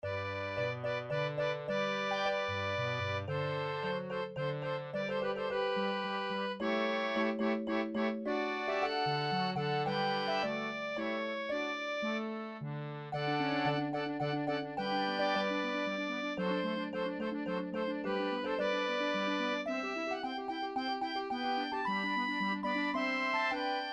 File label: classical minuet, midi